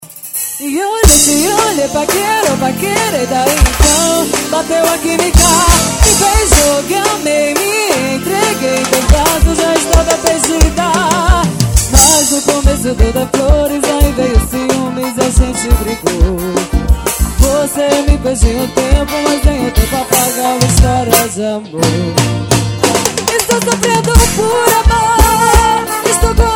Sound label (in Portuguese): forro